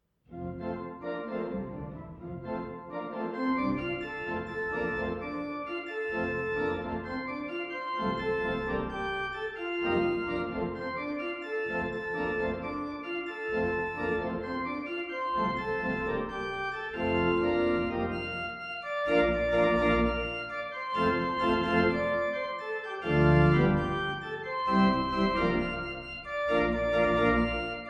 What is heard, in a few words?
Every Time I Feel the Spirit organ